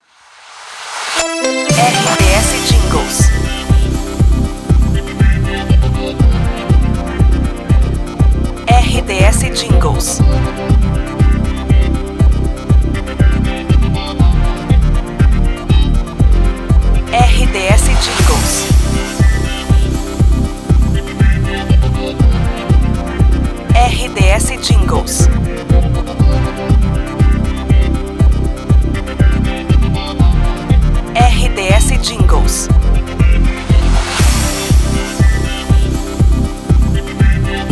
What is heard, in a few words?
trilha news; 012 News 2023